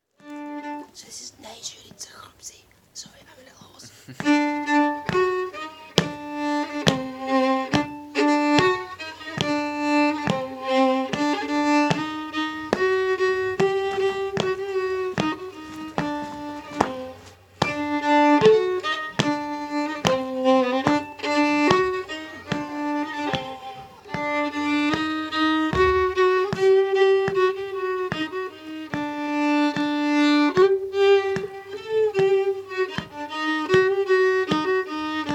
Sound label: Klezmer tune